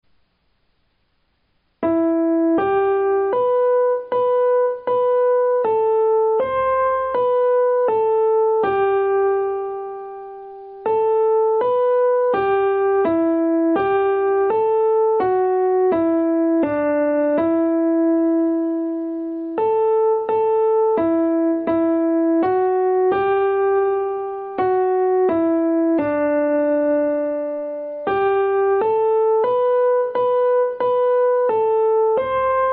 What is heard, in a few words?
melody; o come o come emmanuel